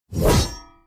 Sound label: ching; dinosaur run